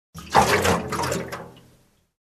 Liquid gas lapping in metal tank

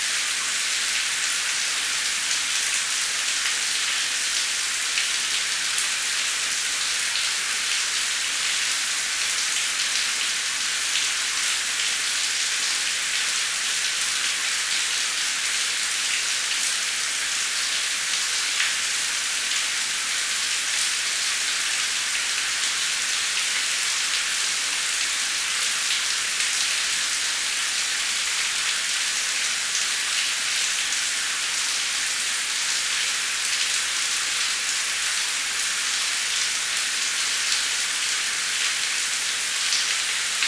Heavy rain bright

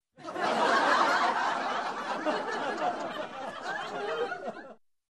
Crowd Laughing

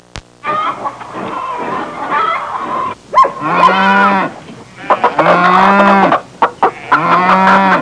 Farm animals sounds

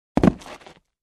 Boxes being dropped bang sound

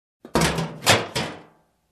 Metal Trash Can Lid

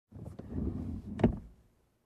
Opening car sliding door

